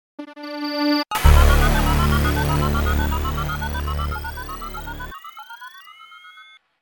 Hearten, Nokia Alerts; Windows Phone apps

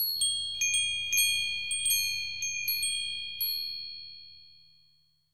Wind bell; Fujitsu F-42A

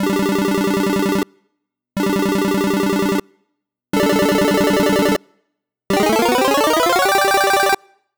Bonus Points; Google; Pixel-4; Retro Riffs

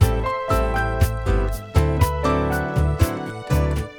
bossa, ringtones; Google; NewWave Labs

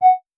Video Record, ui

